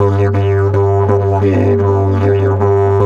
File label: G 80 bpm, didgeridoo; Big Fish Audio - Didgeridoo and Other Primitive Instruments